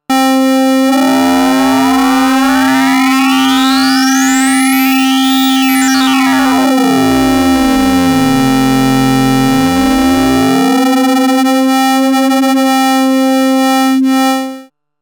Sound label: Waverazor Dual Oscillator X Tune Sine; MOK